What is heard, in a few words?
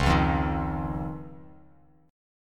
C sharp Major Add 9th, Bass Standard; C#add9 strummed; JGuitar